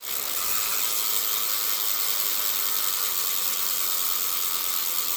bicycle slope

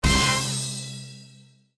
jean, anime

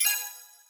decision, button

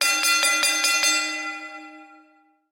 gong played

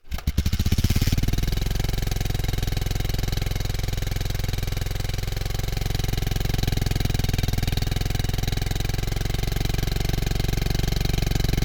bike start up

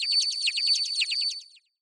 dizzy, anime

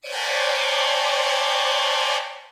dissonance

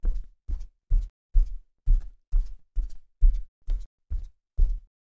walk flooring

